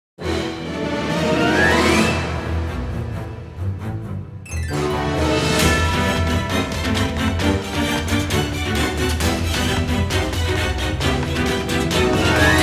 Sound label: Battle BPM133 Loop